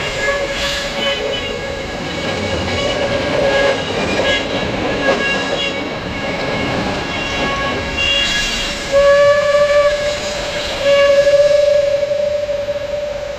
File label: distant train passing, clattering